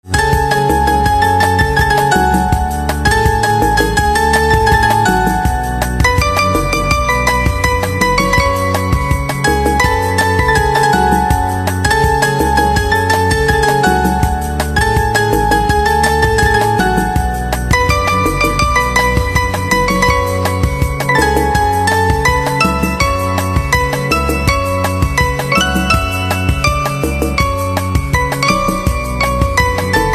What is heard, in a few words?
Piano